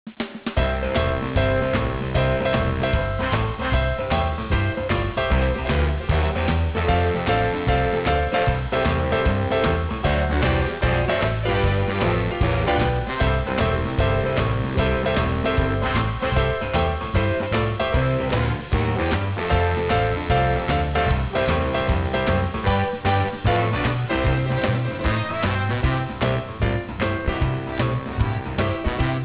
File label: jingle bells jump; talkswitch